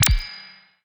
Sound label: spark light funnel end